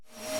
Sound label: Void Suck